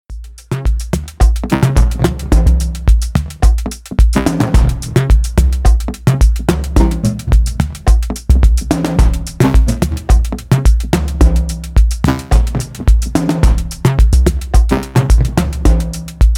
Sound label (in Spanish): ritmos perdidos